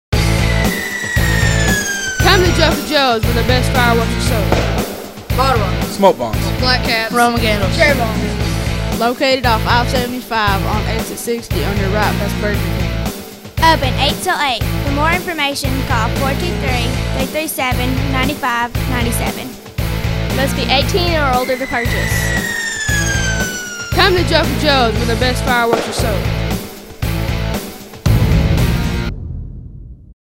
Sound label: Jingle